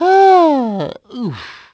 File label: mario lost a life